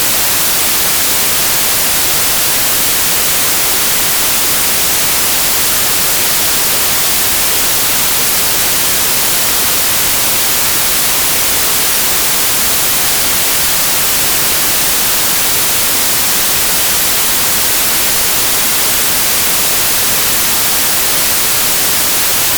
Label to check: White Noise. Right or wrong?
Right